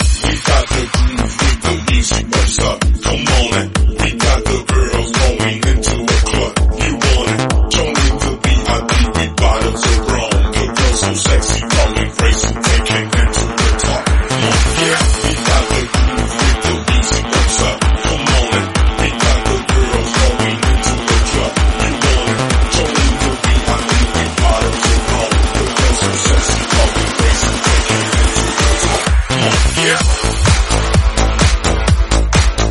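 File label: 128bpm A minor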